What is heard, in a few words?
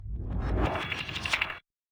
Bumper